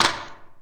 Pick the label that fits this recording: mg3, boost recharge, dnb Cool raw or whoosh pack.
boost recharge